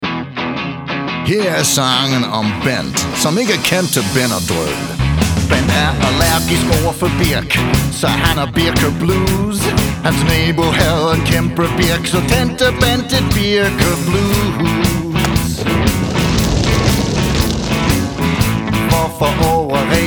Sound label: blues